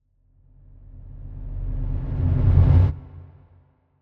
Reversed Low Strings - Reverse - Pulsate; Hollywood Edge; sound designer's toolkit v2

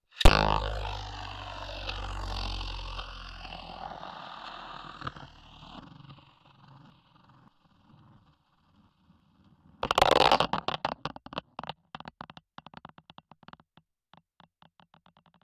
bayblade; NS Studios - ultra sound collection

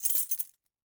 keys step high; DeadFright

